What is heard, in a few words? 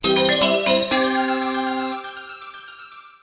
chaos emerald; svencoop; sonic the hedgehog